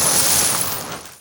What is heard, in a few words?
Bird Cage Collision; angry birds rio